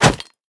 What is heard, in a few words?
Footsteps Dirt Run